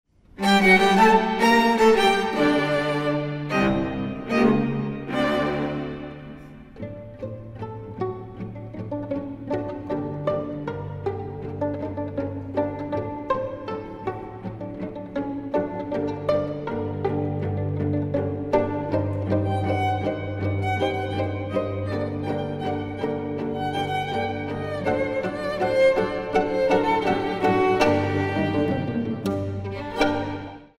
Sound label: tango